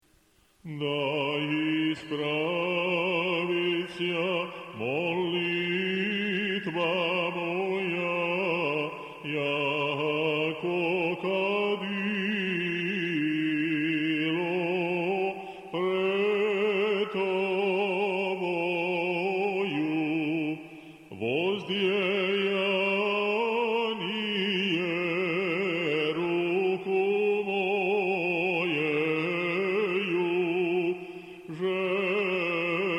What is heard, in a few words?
Osmoglasnik VII glas